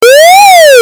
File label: alarm siren one time howl of; salamisound